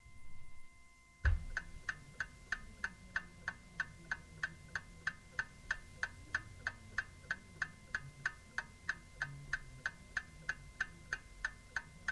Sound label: Clock